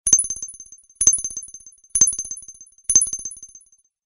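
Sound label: twinkle message tone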